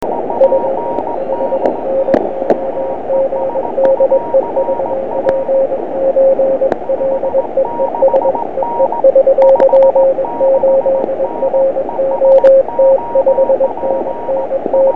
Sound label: 10 cw